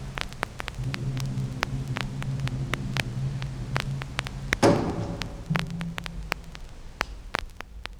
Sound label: Bass String Breaking; JMHS Orchestra 1961